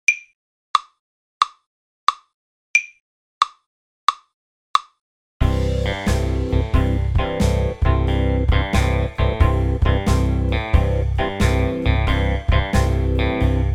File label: 12 bar Blues C